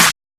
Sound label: Clap, Techno; Techno Sounds 2024